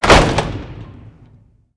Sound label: Door Church Close; Home & Office; Sony Pictures Sound Effects Series Volumes 1-10; Sony Creative Software